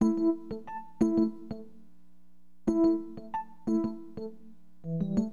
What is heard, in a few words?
EP, Keyboards; HipHop; LiveAct Pool 1